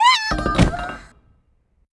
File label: Medica F Dead